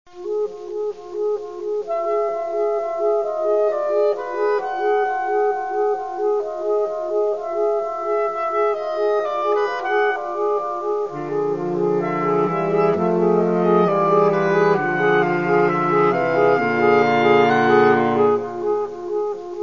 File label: bravo sax modern